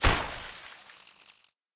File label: Weapon Destroy